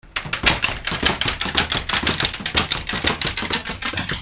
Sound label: punching; thewatcherscouncil; season three